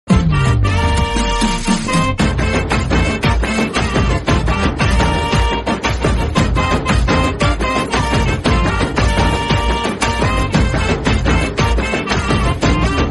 theme bgm ringtone music

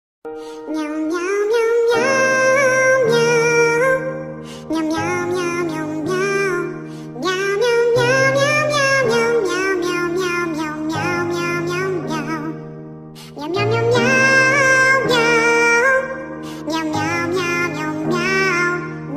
cat version ringtone cover; bongo cat

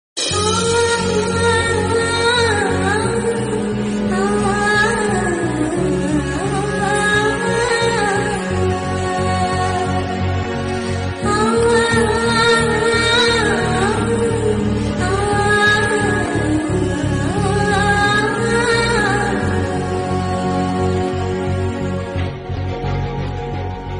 Heart Touching Love Telugu

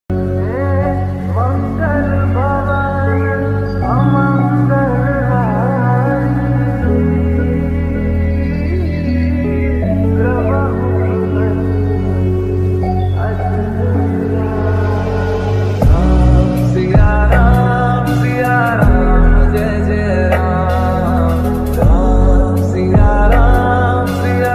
hanuman chalisa ringtone; bajrangbali ringtone

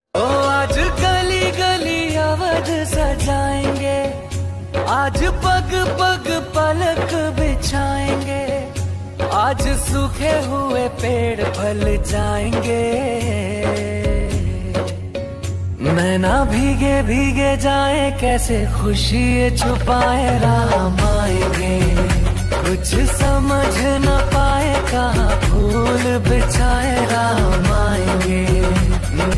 ringtone ram bhajans bhajan

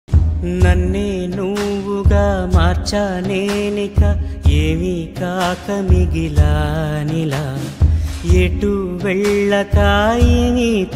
sad bgm telugu; awara

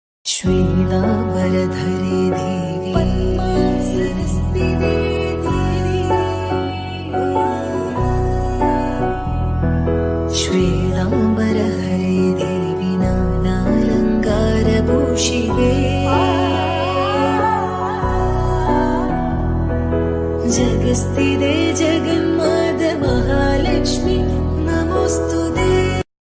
Devi Bgm Devotional bgm; ugra Devi

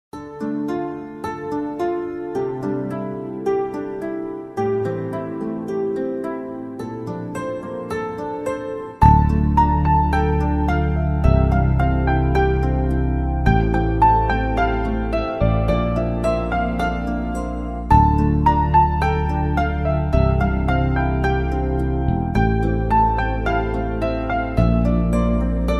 love bgm; ms dhoni

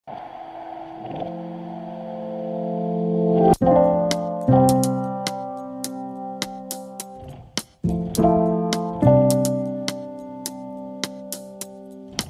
sad bgm; thiruchitrabalam; the reveal; nithya menen

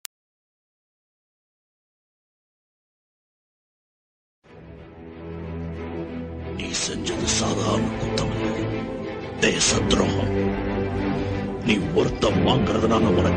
theme bgm; indian 2; kamal haasan

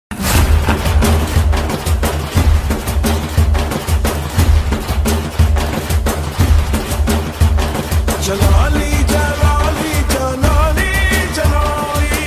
Tamil bgm ringtone; lal salaam

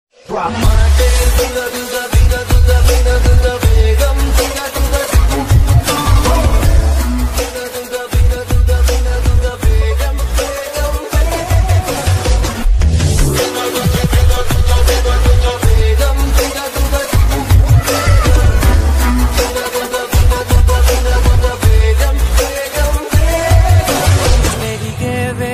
remix ringtone

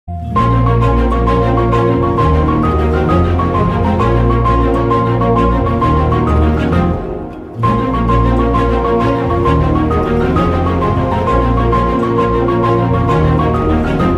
text message bgm ringtone; thiru movie; ms ringtone studio; ms editz

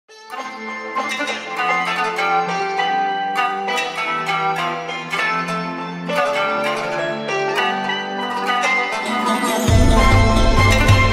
eid mubarak background music

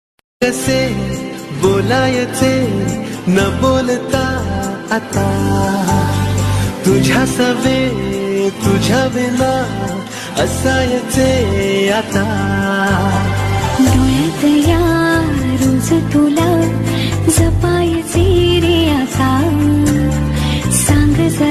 marathi ringtone, love ringtone

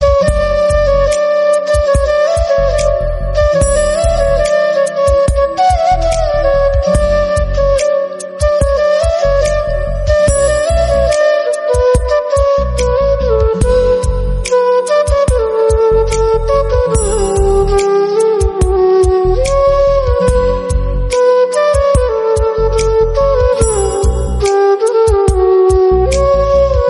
Instrumental Music; RHTDM

